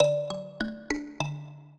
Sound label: minuet, mbira; Mozart